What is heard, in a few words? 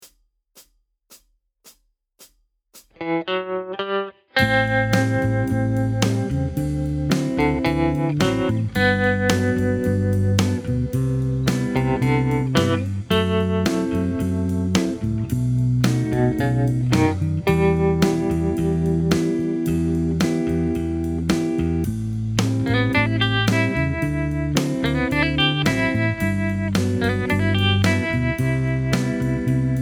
Backing Track